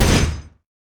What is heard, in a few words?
steam golem basic attack; LoL; blitzcrank